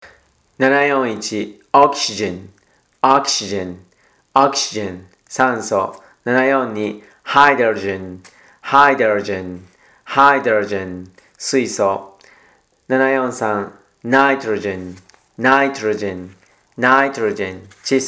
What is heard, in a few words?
tango